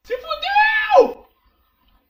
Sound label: man yell